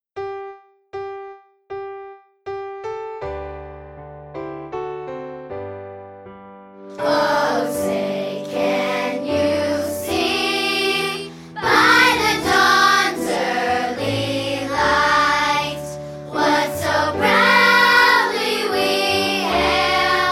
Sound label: Star Sop, Choir